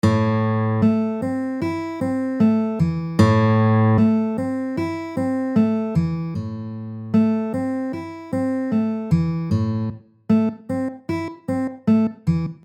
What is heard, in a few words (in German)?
anschlagsdynamik